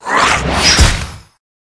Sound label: felidae attack